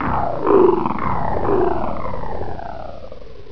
pain; coldburn

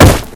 damage wood

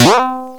spring; sven; sonic2

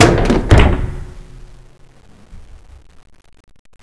button

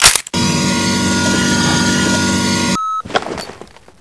froster reload, weapons

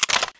clip release

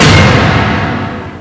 shot; spasm flutorion; sven

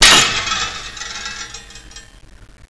glass, debris

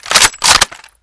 sniper bolt

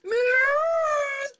attack; votemap; kani

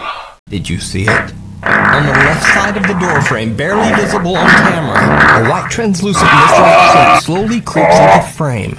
fart on door

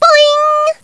boing

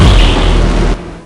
portal open

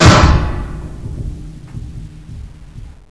big stop

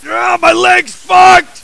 Die; USEC

